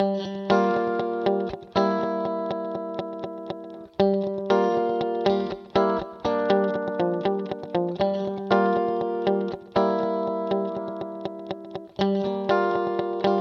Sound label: Guitar Yamaha Rev7 Delay LR; Vintage Digital